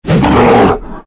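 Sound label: bear attack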